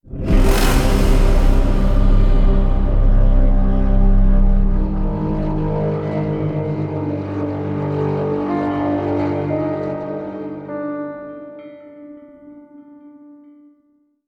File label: Stinger (Piano); Mob of The Dead; Brutus Dead